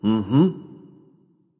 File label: agreement; smite; voicepack; hou yi